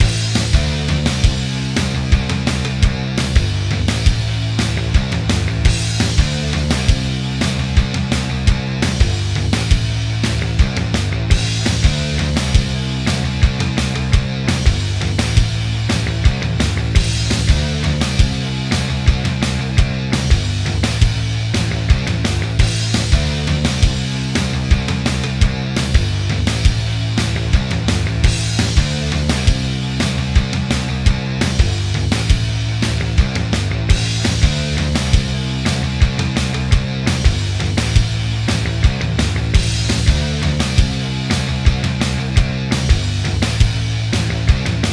3d Music

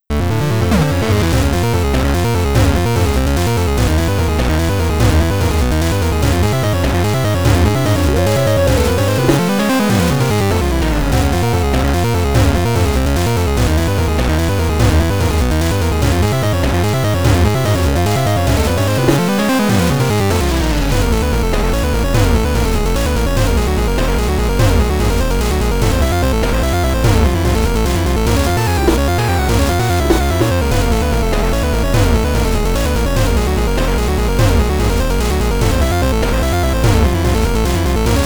Chiptune; Burning Building

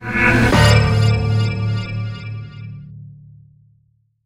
Ui Empire-Unlock; Space Tyrant

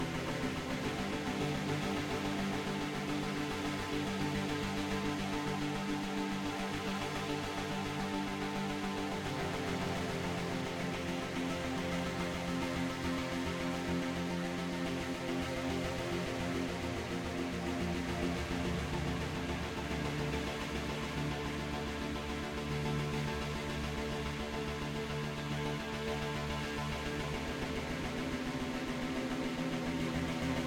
Music Tension Battle

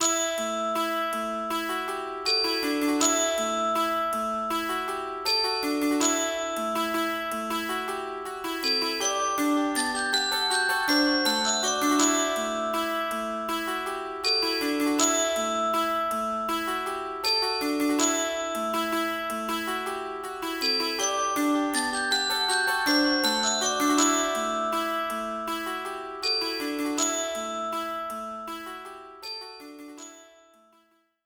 Pause Menu; Diluvion Original Soundtrack

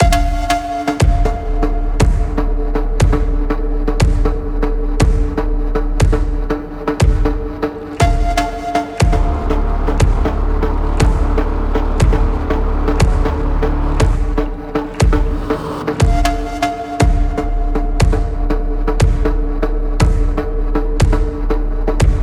Flute Float

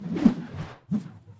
fan

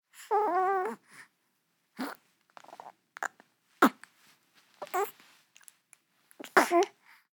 Voices baby three weeks old outburst

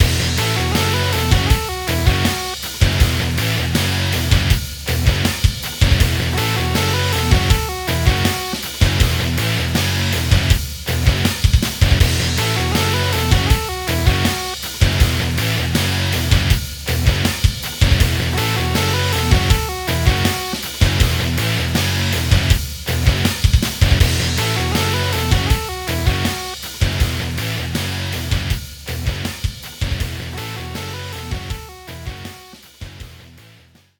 3d Music

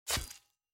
sword Blood

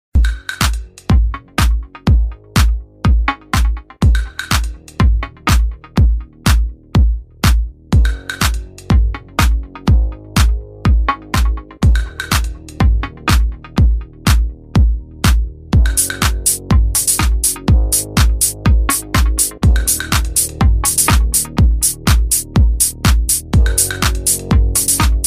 Cave; Color Switch

